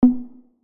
spinner bonus; 『Mikan』

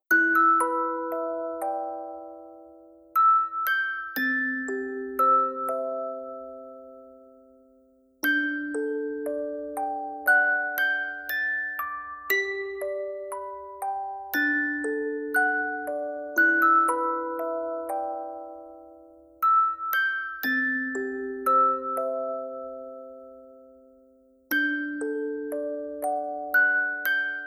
fail sound; 『DTMoon』

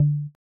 pause retry click; osu skins; Luxariah Default Minimal

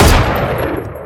key press; Clicking Head

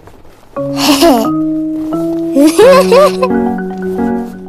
applause; osu skins; nautzz night

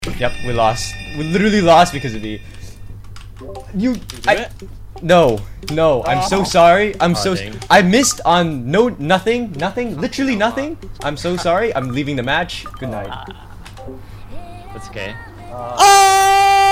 applause; osu skins; Amasetic4.0; gnahus

